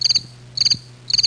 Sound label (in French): cigale; CD Media Le CD-ROM - 1995-11 November